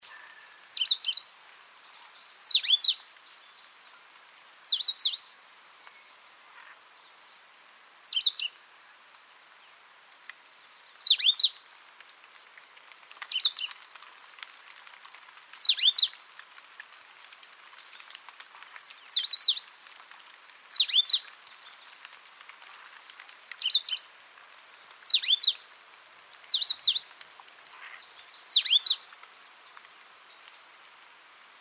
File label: coal crested finch